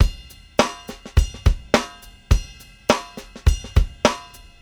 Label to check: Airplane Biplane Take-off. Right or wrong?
Wrong